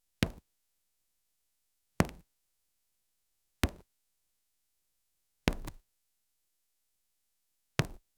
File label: tap on screen; interaction